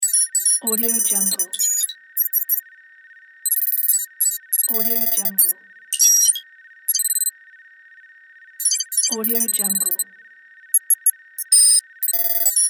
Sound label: hitech cybernetic device; art-of-sound